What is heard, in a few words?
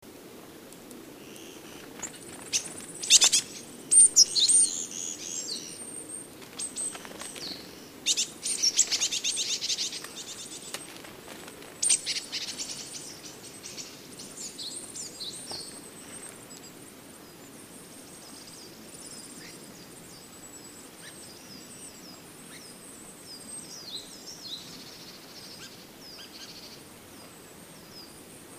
red alert